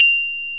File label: piano G5